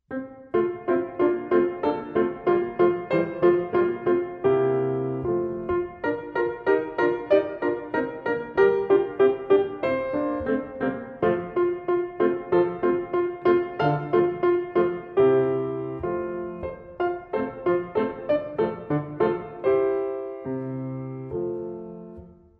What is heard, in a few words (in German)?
piano solo kinder, piano kinderlieder; Das Mädchen und die Hasel